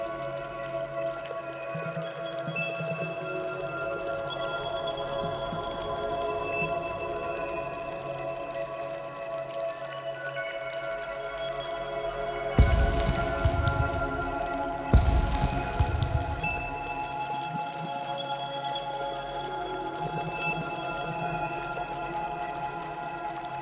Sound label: lair, ambience; zaluraaks